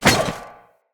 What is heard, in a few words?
Zipline Brake Foley Contact; Gadgets; Parkour Zipline